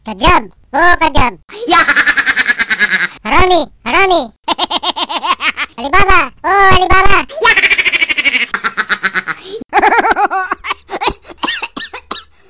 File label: Crazy Laugh